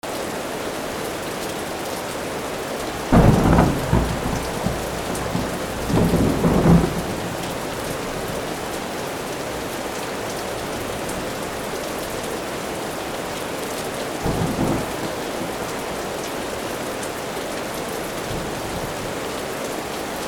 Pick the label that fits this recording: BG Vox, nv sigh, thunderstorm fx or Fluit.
thunderstorm fx